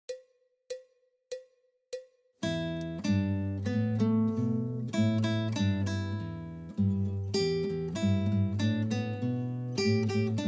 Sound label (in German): Rockstueck, gitarre